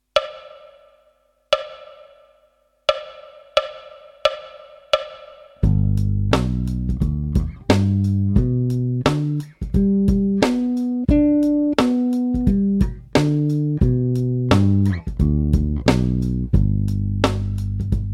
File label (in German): Dur-Dreiklaenge 3oktavig